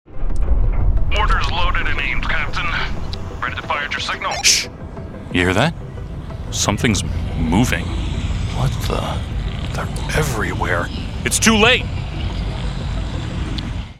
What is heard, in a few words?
Creature Attack